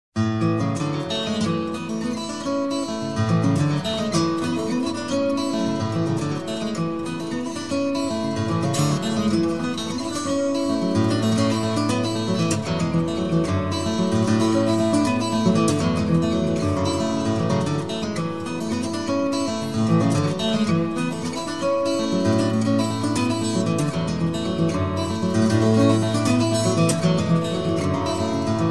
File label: acoustic acoustic